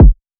kick C#, drum one shots; Cymatics; Cobra Hip Hop Sample Pack

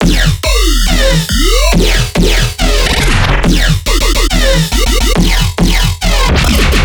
Riddim Bass Loop F# 140; Bass Loops; Claw; Ghosthack; Riddim Essentials Volume 2

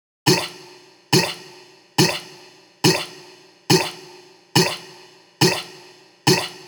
Vocal Chant Loop 140; Ghosthack Advent Calendar 2018 Day 9; Vocal Loops

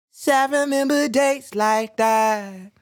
Sung Phrase Dry, 140 BPM F# Min, vocal; Remember Days; Cymatics; Euphoria Vocal Sample Pack

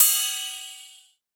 Ride, Cymbals; Drum One Shots; Cymatics; Light Free Sample Pack; Bonus Samples

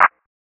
Clap; Withlove; Cymatics; DOPE DRUMS VOL. 1

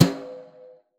Maple Brush, snare; Drums; Ghosthack - Free Snares 2023

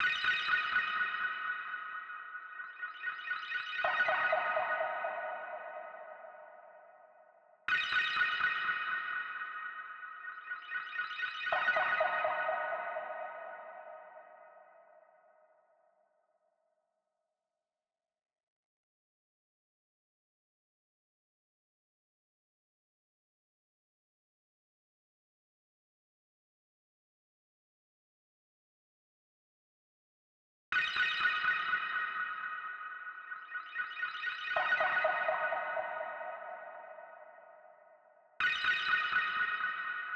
125 BPM B Maj Arp; Trap; Melody Loops; Loop Stems; Cymatics; Nightmares Trap Melody Loops; Quarter Back

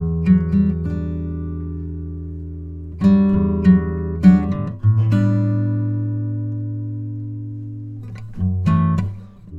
Xmass Guitar Loops 100bpm Gmaj; Guitars; Ghosthack; Advent Calendar 2019

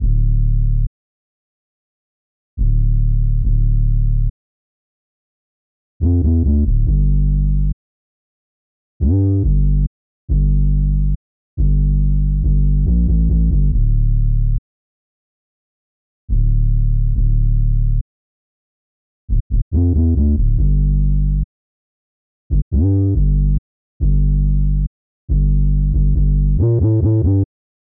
140 BPM D Min, Drill; Loop Stems; Cymatics; 2022 Melody Collection - Drill; Woi

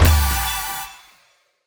Hero Death FX - GMaj9, Video Game; Cymatics; Video Game Sample Pack Vol 1

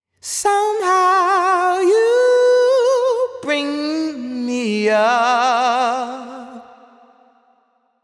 Sung Phrase Wet - 80 BPM G# Min, Vocal; Bring Me Up; Cymatics; Euphoria Vocal Sample Pack

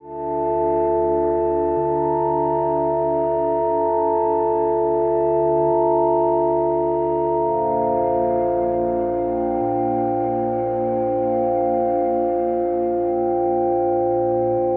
65 BPM D Min Synth Chords; Loop Stems; Cymatics; Perfect Timing

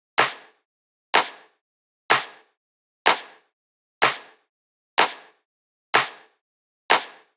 House, 125, Claps; Drums; Loops; Drum Construction Kits; Ghosthack; Drum Mega Bundle; Drum Hero 5

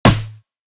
Perc, Dubstep; Percussion; Drums; One Shots; Cymatics; Dubstep Starter Pack

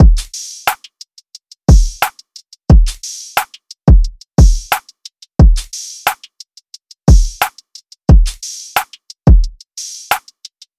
Thinking Drum Loop - 89 BPM; Cymatics; Vibes II Drum Loop Collection

